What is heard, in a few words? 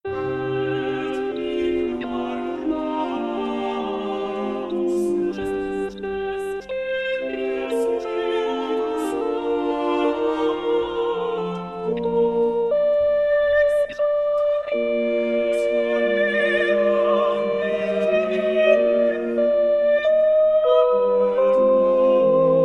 Credo, sop; Stage Zelenka 2023; zelenka zwv21